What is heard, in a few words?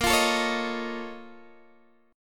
A sharp Minor Major 11th, A sharp, down, Guitar, Open D